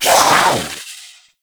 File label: Kavat Vocal Attack Medium; Warframe; Warframes; Khora